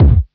Kick, Drums; One Shots; The Duke

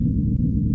Machine Hum Loop

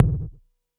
Tom Wiggle; Drums

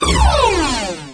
hoverbike shut down; There; ThereClient

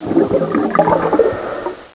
WATER; Canon CameraWindow

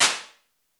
Hybrid Plates Small Plate 0.5s, Impulse Responses; Ableton Live 12 Lite

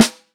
Snare, drums; Ableton Live 12 Lite Core Library

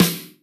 Snare Acoustic, drums

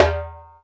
Timbale Rim Thumb; Drums; Misc Percussion

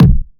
Kick Beat

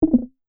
error; Microsoft Visual Studio 2022

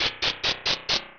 Dungeon Stairs Climbing; Open Zelda; Quest Designer 2.0.4b

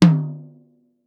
Tom Mid Acoustic Strong; Drums

